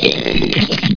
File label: fat eat; JOHNNY